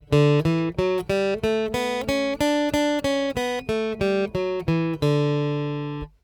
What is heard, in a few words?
D Major Scale, guitar